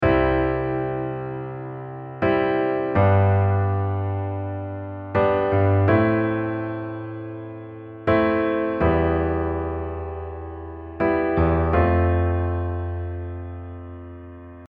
white note b, accompaniment pattern

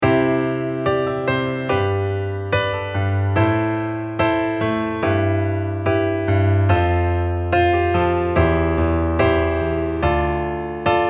add9, accompaniment technic

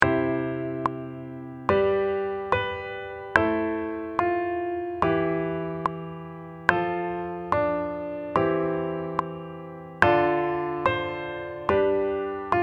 approach note, accompaniment pattern

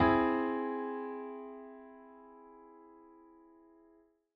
triad C aug

